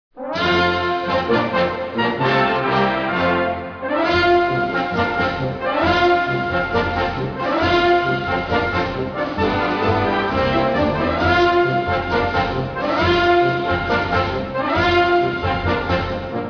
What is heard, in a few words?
trombone